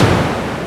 PRC CS80; Roland L-CDX-01

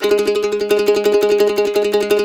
C E trill G#, Blues Guitar

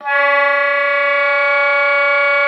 OBOE3; Xsample VOL-6

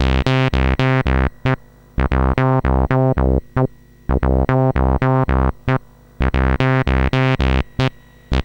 C64 Bass, Commodore 64, Chiptune

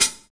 Folk Pedal HiHat

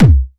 Attack Kick

